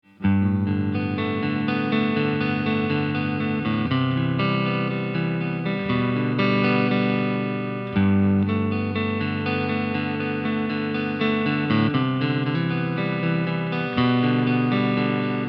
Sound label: Neck Clean